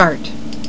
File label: START